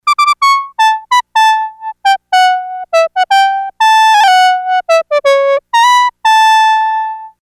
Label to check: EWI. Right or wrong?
Right